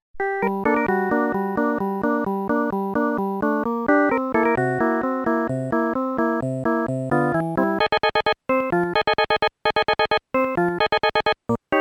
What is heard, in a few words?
Fight; dragon quest game music super collection vol.1